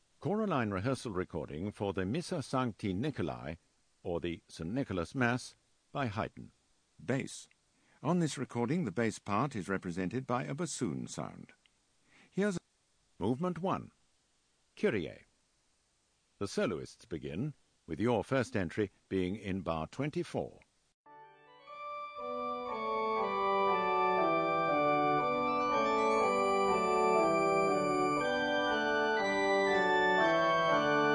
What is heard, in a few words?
Bass; Haydn Missa Sancti Nicolai